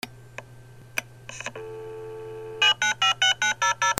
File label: PHONES